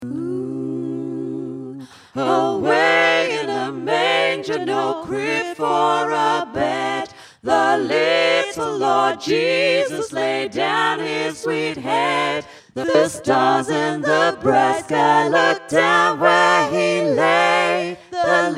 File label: away in a manger full, Gospel Choir